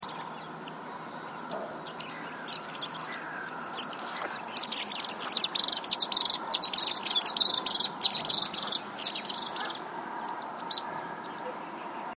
grafiskare

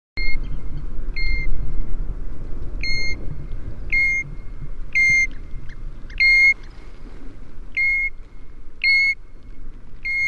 Ljungpipare

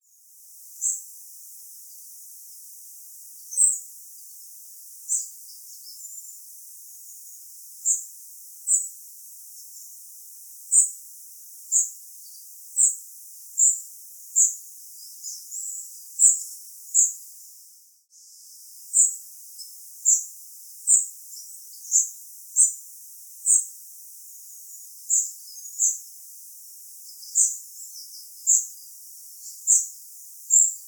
Grå flugsnappare

